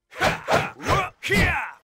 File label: auto attack; metin2